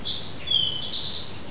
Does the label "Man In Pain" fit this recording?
No